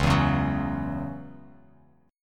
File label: Db Minor Double Flat 5th Db, Bass Standard; strummed; Dbmbb5; JGuitar